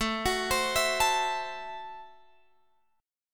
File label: A Minor 6th A Guitar Drop D